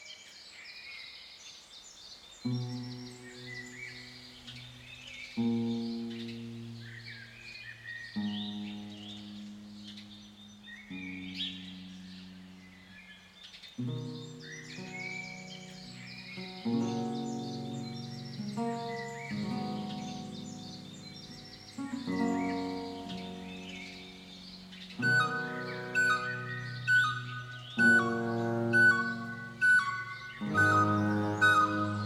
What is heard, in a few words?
flageolet oiseau